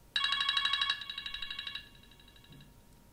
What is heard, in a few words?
apple iphone 5 beacon ringtone